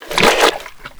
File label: Can Pickup Watery